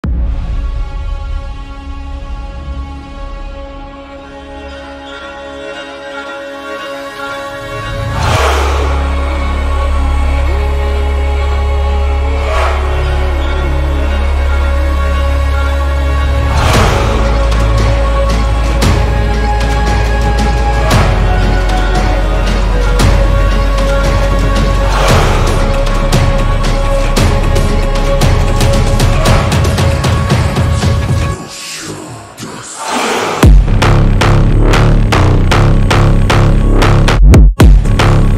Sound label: tribal phonk cinematic brazilian phonk gym